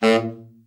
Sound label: TENOR, Sax; Giga Samples Collection